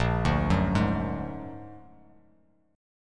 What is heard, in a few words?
A sharp Augmented 7th A sharp Bass Standard; A#+7 arpeggiated; JGuitar